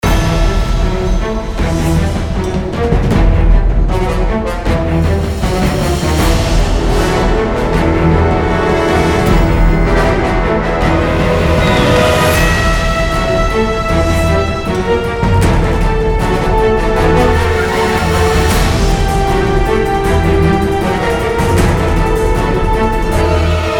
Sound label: Bgm Fortress